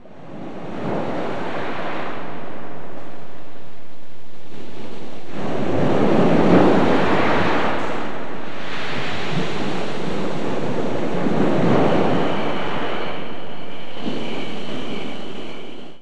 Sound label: SEA, SEA WATER; Best Service - XXL Production Effects